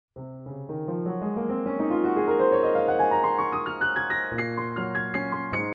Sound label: B scale